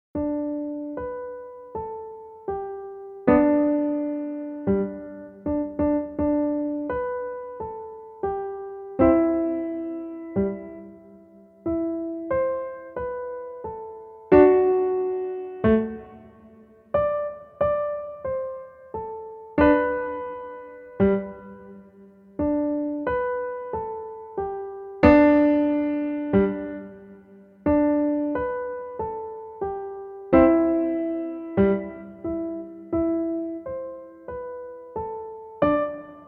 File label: Jingle Bells